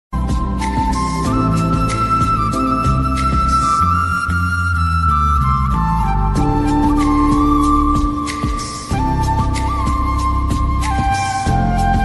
flute ringtone flute music ringtone mobile ringtone